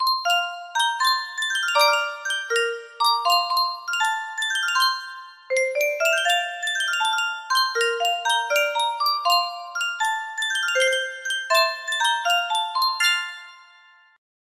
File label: sankyo music box; paperman